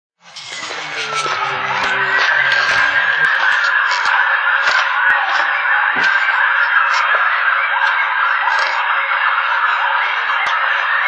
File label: coyote